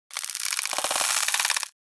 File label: Insectoid creature alien clicking